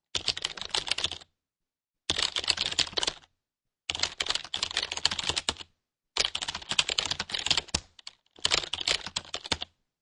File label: Computer Keyboard Typing Fast